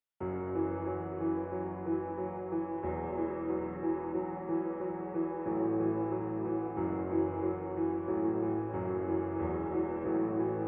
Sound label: piano lite